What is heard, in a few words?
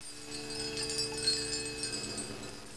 magic Tinkle; CHECKERMANIA 1.04